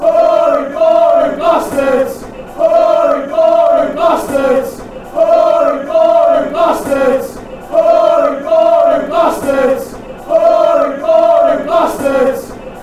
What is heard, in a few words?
boring bstards fanchants